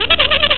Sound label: NYAH NYA